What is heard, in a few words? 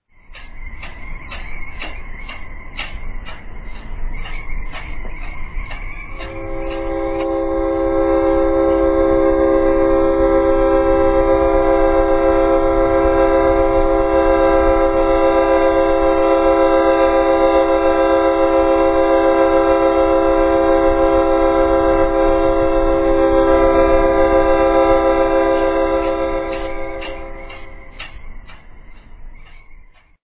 winharmo